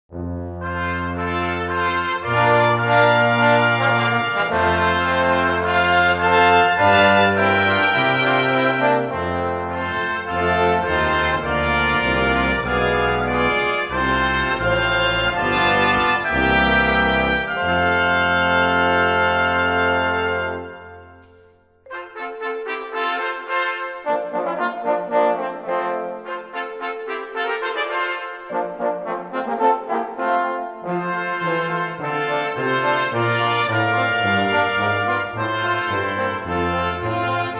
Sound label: brass ensemble